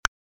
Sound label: button click; puzzleweave